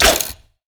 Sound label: toss; LoL; jinx base